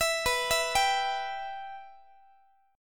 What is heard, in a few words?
B Suspended 4th Sharp 5th B Guitar; Bsus4#5; arpeggiated; x,x,19,21,20,18